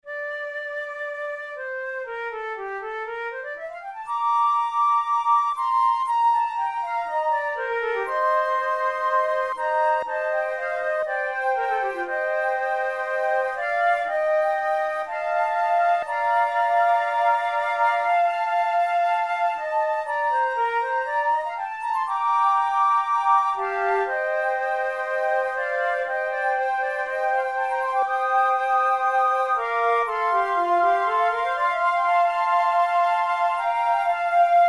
flute sonata; mattheson

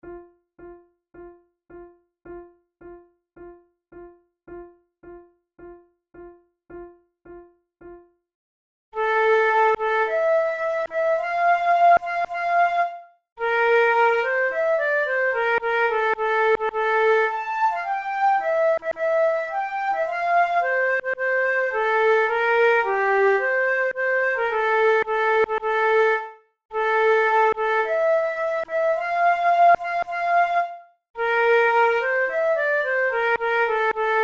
march duet, flute; lord cornwallis

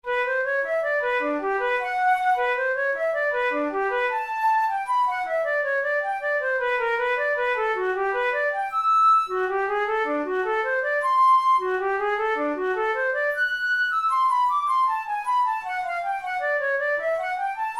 waltz, flute tunes; kohler op66

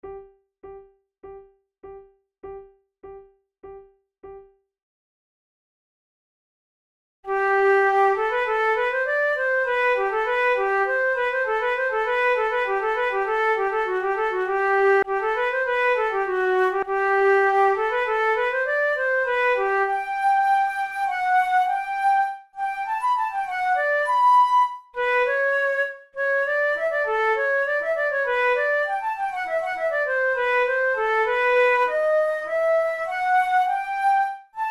allegro, flute tunes; loeillet de gant op5 no5 ii

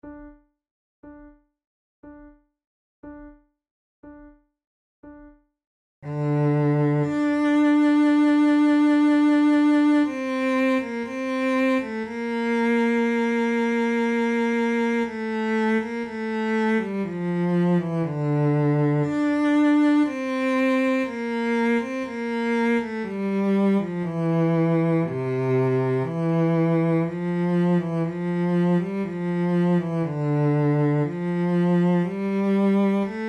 recorder sonata in f major largo; marcello op2 no12 iv

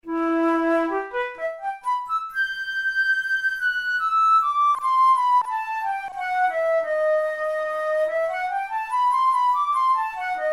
studies for the boehm flute; drouet 72

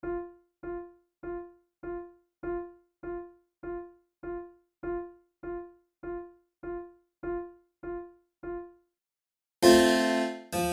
violin sonata gavotta; corelli

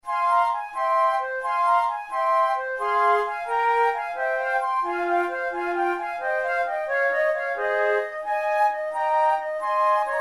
flute sonata gigue; mattheson

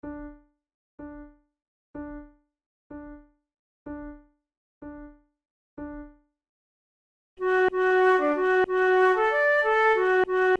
duet, flute tunes

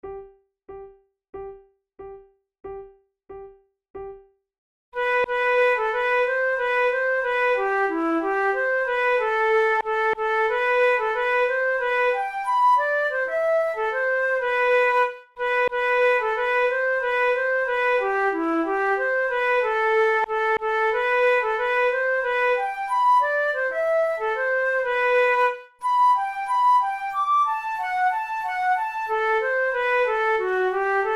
march duet, flute tunes; march in Macbeth

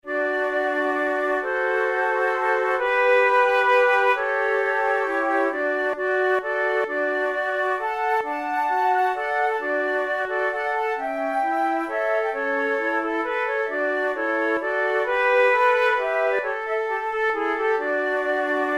waltz trio, flute

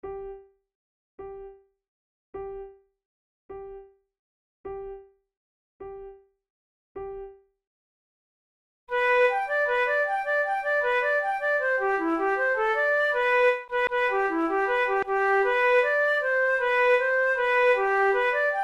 arabys daughter duet, flute

